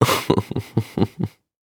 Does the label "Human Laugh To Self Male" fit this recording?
Yes